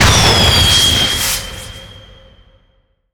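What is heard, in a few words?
fireworks launch; ambient; Left 4 Dead 2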